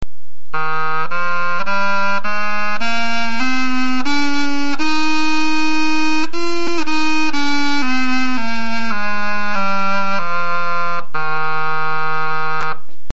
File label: cornamuse